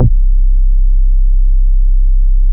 CLUB BASS, Bass Analog & Synth, DISCO; USB Soundscan vol.12; AKAI

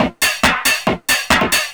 drum 138, Techno; drum-loops

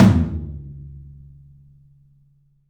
MIXTOM, Low Toms, 3D Percussives; E-MU Producer Series Vol. 5 – 3-D Audio Collection

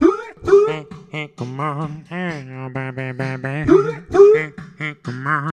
VOX, Roots Disco; USB Soundscan vol.32; AKAI

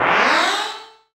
FX Dance

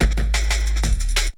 Essential beats

